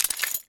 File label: m60 chain remove; garrysmod